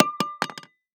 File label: can tin empty drop wood